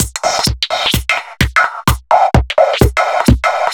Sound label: Beat, 128bpm, uk garage